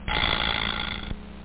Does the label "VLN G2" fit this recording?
No